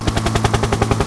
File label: copter